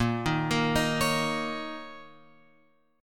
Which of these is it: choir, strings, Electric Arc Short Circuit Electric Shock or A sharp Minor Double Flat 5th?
A sharp Minor Double Flat 5th